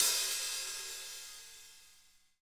hat slosh

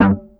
EFX SHRT G2, bass; Sampleheads; Ultra Freakin' Bass; EFXBASSSHORT

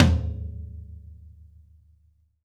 TOM RSHOT; 90s sample CD

